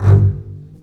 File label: BS G1 MRC L, CBS MARSHORT; East West - The Ultimate String Collection